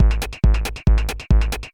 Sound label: Tech loop